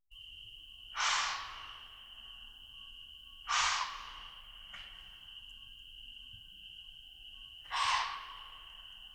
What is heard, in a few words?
white tailed deer